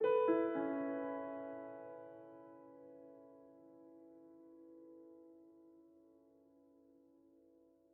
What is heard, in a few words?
Env Field Day Ground; the legend of zelda tears of the kingdom